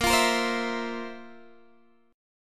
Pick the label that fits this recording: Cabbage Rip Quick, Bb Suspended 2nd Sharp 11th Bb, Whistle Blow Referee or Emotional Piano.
Bb Suspended 2nd Sharp 11th Bb